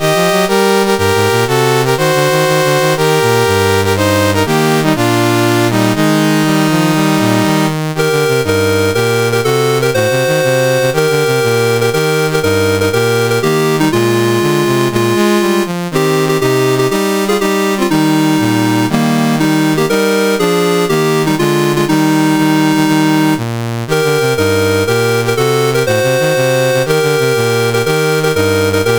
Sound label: Mozambique, National Anthems, CSG8580R5